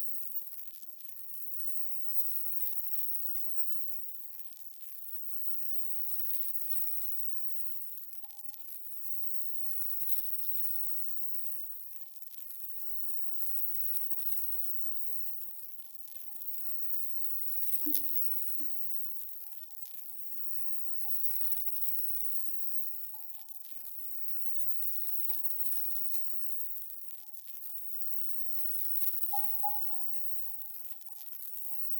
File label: Pigon